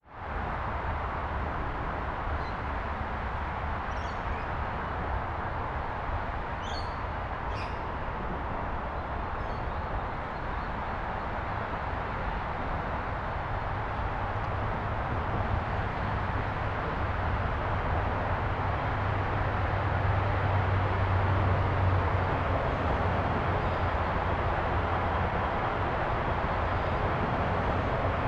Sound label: bike; Dight's Falls; Dight's Falls Tour 2026